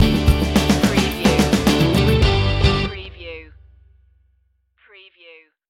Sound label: piano groovin stinger